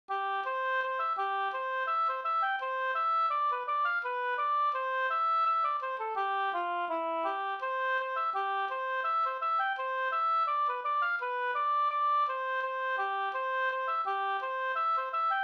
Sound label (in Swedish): ganglat